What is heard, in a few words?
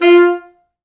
F3, Pop Brass; Best Service ProSamples vol.19; AKAI